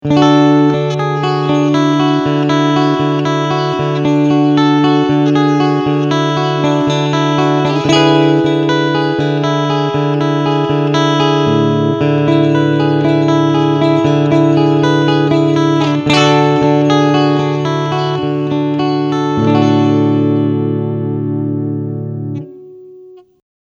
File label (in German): Clean beide Pickups